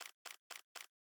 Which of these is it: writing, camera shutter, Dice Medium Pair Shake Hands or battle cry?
camera shutter